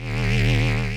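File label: Ambience Sci-fi Energy Loop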